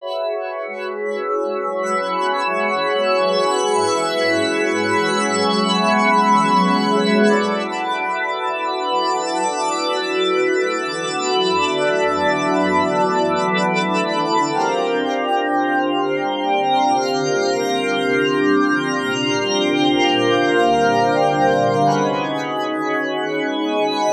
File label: SPARKL GLISS; eShop